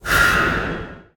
lockItSpinsLeft; narcos

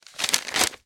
map Unfold; rage of the seas

